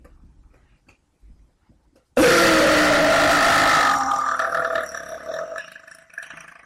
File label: burp